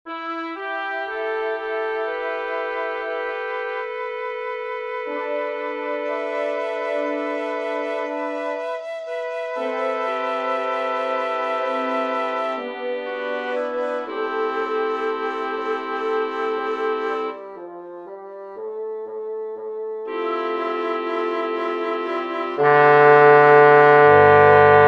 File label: bass